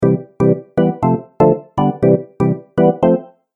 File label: Organ Game Music; Cartoon Music Sound Effects